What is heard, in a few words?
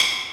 Hit Drum Reverb